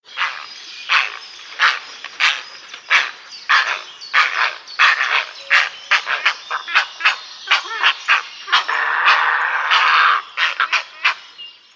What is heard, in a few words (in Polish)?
czapla